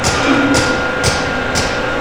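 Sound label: 120 BPM; RHYTHMLOOPS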